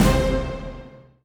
Combo Even Hit; Duel

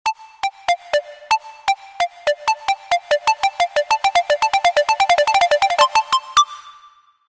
Final Lock Sound

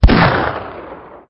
Rifle; CastleMiner Z; Xbox 360

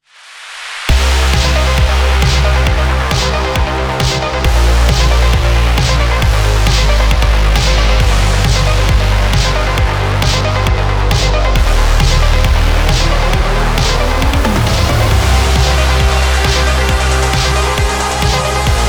BGM Intro; Chap1 MainAlt Intro

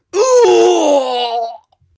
Soul Master First Death Hit

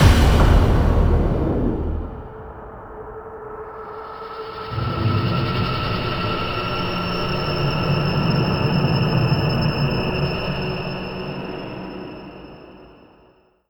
Ambient Sting

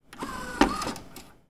mechanical

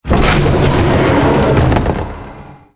Ruins Spikes Down

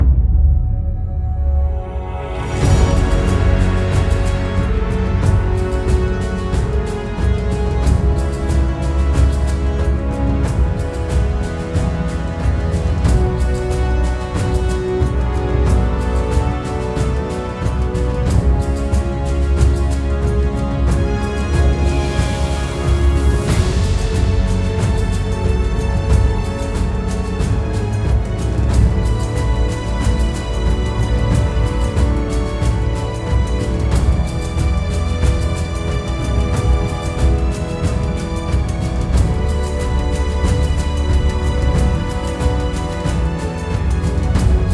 Level Boss; karnage chronicles vr

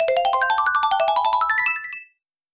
Receive Expensive Item

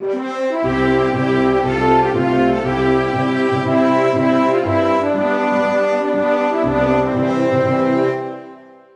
Anthem Thailand; Buzz! The Mega Quiz; PS2

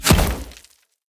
Hit Bullet Wood

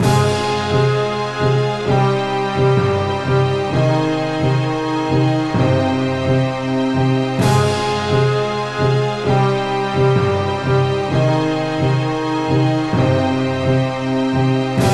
Fail; Allied Ace Pilots; NDS

